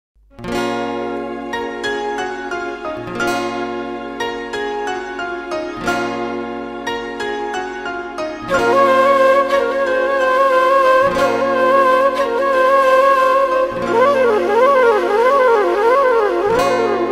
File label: Beautiful Instrumental Flute Ringtone; ringtones